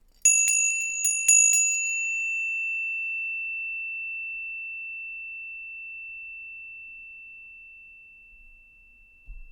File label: Chimes